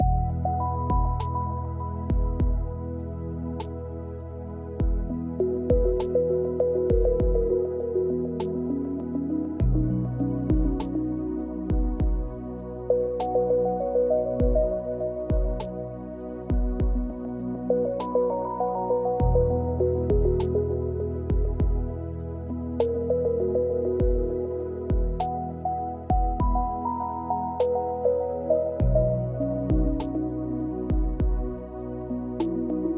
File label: music home loop no tail